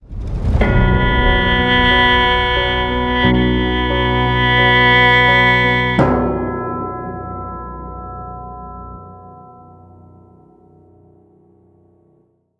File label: Tension Stop 99bpm 4bar; project zomboid